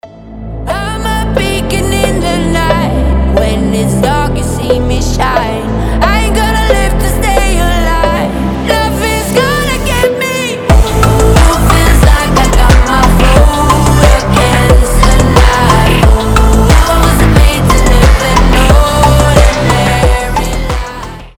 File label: phonk remix